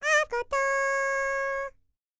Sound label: HIMALAYAN, World Voices; ILIO; Vocal Planet VOL-5